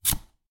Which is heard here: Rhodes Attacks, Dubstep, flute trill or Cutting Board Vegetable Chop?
Cutting Board Vegetable Chop